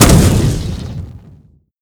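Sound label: magic fire big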